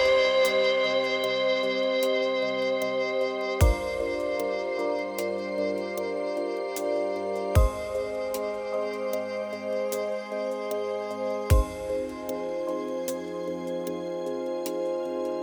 Warm Piano

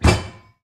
slam

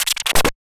Crack Scratch; FREE SFX PACK VOL 2

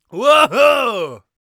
excited, Warrior Voice; Warrior Voice Pack

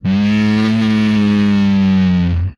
Inhuman Moan, Vocal; retro arcade; MMRetroArcadeSoundsPack

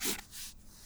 Thicc Tip Lines, writing; Books, Paper, Writing

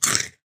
eat, creature

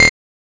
Computer Drill; FREE SFX PACK VOL 2

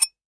Ceramic Glass Scratch; Sample Growing Stream 002; Ear Candy

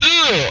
attack, vocal; retro arcade; MMRetroArcadeSoundsPack

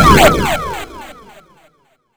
blow, electrical

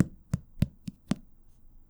Softcover Taps, books; Books, Paper, Writing

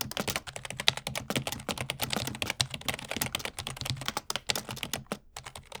Keyboard - Typing

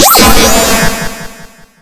Retro Weapon Electric

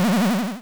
square radar; Square Waveform sfx

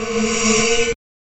Breath Voice; FREE SFX PACK VOL 2

